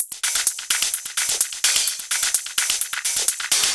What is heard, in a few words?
Loops 128BPM, Drumloops